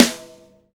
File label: TY Rim, Roland BK-5, Snares